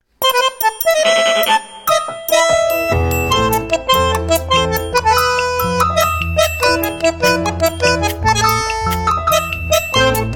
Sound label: Tango; Nokia Asha 500; system ringtones